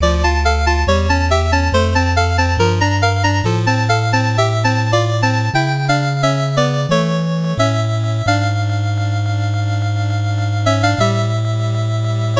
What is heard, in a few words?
gameover; panicpumpkin